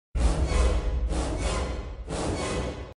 Upcoming Suspense

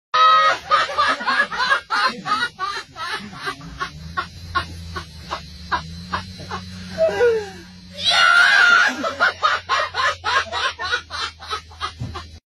hahahaha

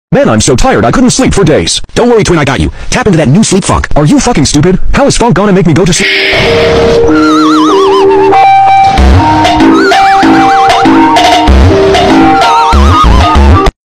Sleep Phonk

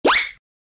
slip

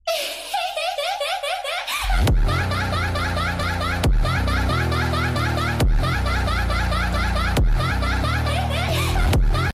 Rage Power Phonk Laugh; goofy ahh crying meme sound effect

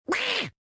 Duck; R.E.P.O; Meme Sound Effect

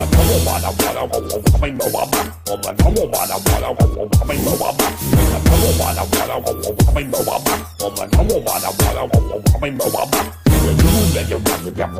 Two Blue Potatoes Singing

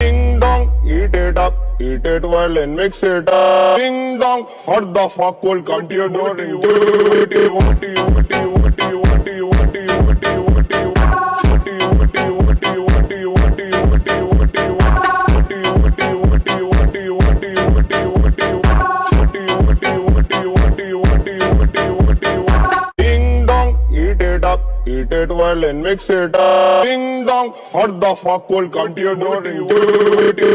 Ding dong eat it up phonk

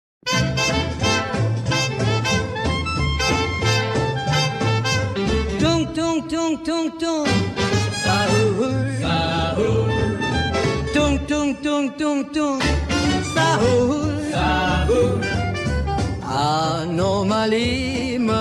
Tung Tung Sahur Jazz 50s; brain rot

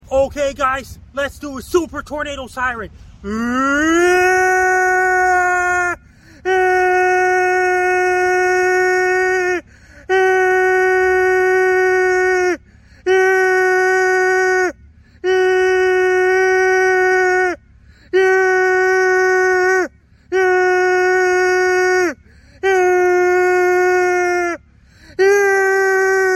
super tornado siren; made with Voicemod; tornado siren sound variation

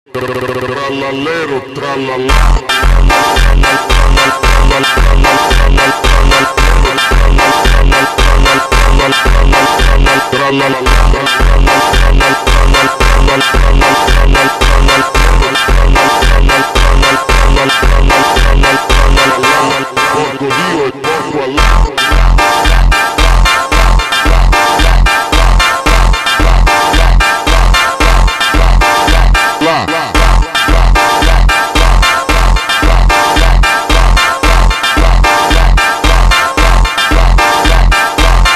FUNK; TRALALERO TRALALA

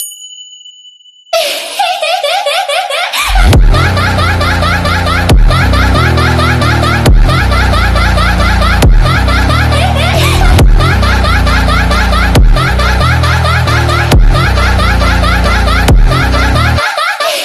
Evil Laugh Phonk

